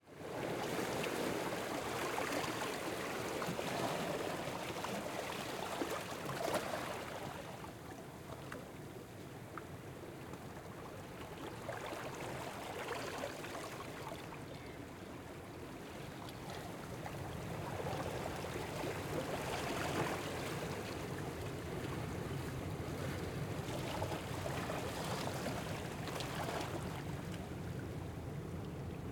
Rockpools Rocks Waves Crash White Water Passes, Island Bay Wellington New Zealand; MKH8040; Aotearoa New Zealand; Trent Williams